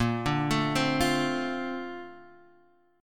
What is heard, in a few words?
D-Minor 7th Bb, chord